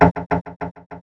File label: clock tick; Qabalah Trainer